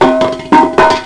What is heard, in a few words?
PIPE